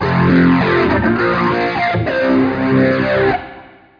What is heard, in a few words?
rock bit